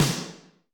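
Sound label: Snr Wood Shed, snare; Alesis D4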